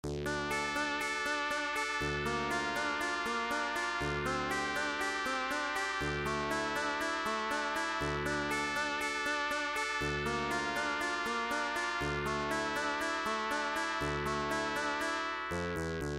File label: adlib opening; ultima